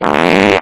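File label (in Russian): пукане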